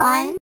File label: intro pixel; Funkin